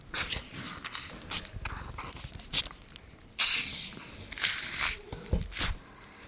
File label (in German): öffnen der Flasche; Wasserkraft Öffnen der Flasche; Klangexperimenten